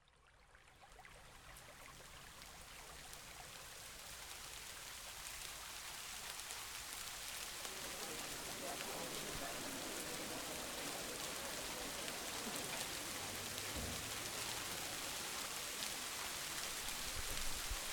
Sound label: Ambience; Sound Design Evergreen, Hercynian Colony; fan-work; Massif Press